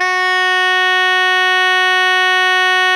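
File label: soprano saxophone F#3, fortissimo; FF; Greytsounds; Philip Wolfe David Crozier's Saxophones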